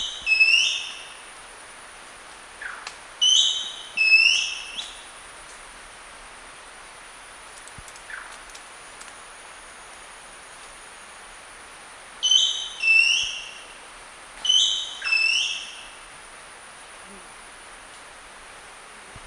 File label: Sri Lanka Blue magpie